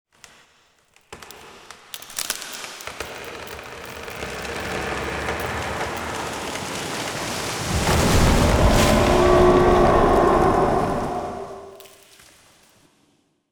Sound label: Tree Sound Start